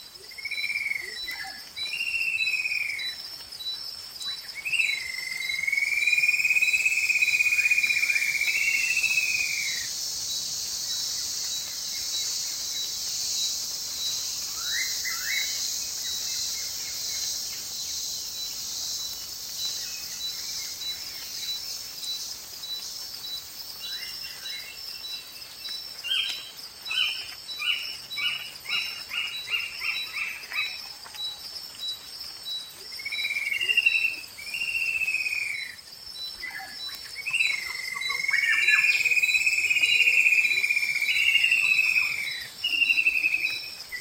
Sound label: papou equatorial forest, soundscapes